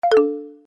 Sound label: ding